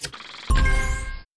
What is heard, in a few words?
shoot hit blast right answer